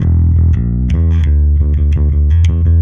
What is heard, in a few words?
EBass Brdg Fing 170 E1; musicradar; bass boutique